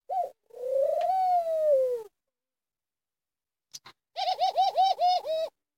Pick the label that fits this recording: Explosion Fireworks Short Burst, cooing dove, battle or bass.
cooing dove